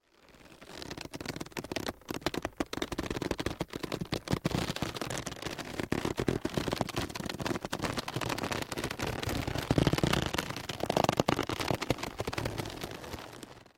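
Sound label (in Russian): ras, zvuk rastiagivaniia, stretching sound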